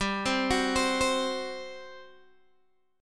G Major 7th Suspended 4th, G, down, Guitar, Open D